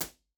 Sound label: snare; genesis 6 studio live room drum set; b-format